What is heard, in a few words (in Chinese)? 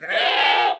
山羊尖叫冲撞前声